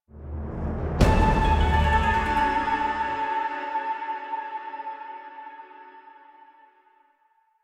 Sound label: Card Appear Cue, Music; Warframe; Jade Shadows